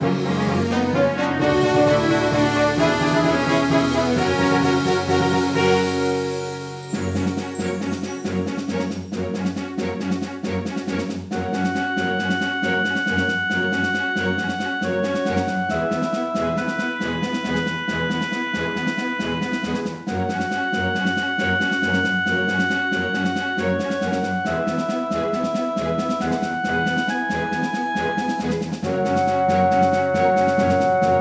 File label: Victorious Post Battle